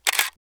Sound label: camera click